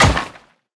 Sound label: Peak impact; Barbarians Jokull